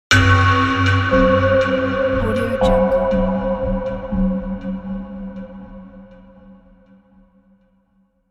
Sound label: dark cinematic logo